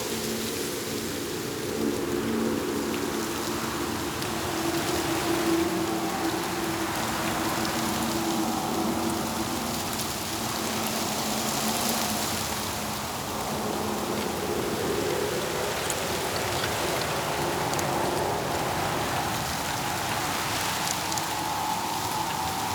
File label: Forest Exterior Eerie Breeze Amb; Warframe; Ambience; Grineer Forest